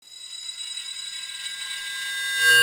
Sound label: 8-bit; free art; holy horror